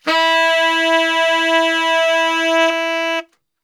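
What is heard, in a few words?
E 2 SAX STR, saxophone; Best Service; Brass Super Section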